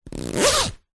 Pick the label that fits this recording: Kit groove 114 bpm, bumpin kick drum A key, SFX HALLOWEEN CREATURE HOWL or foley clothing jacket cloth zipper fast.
foley clothing jacket cloth zipper fast